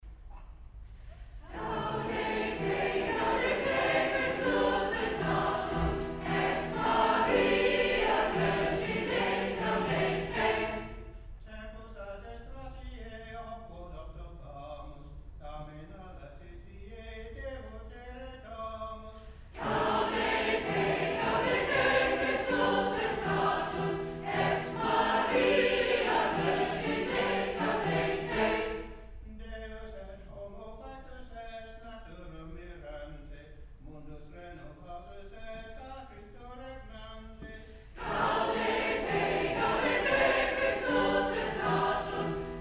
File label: Gaudete